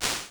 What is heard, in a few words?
Gated Chamber; Small Spaces; Apple